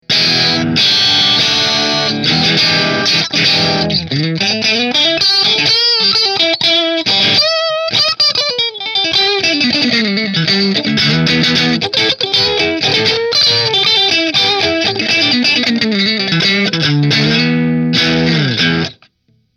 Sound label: Middle Marshall; Parker